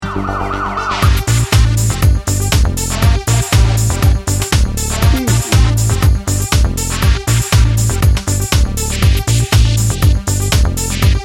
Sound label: party in motion